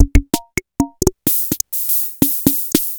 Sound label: Hohner Rhythm-80K; Sliced